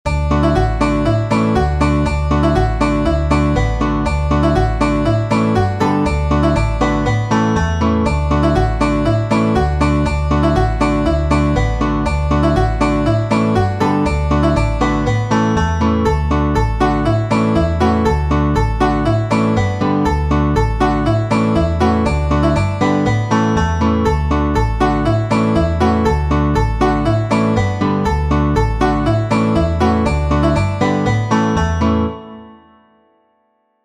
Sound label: polka, Irish banjo; The Britches Full Of Stitches